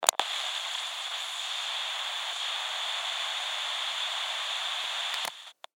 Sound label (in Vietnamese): Tieng nhieu radio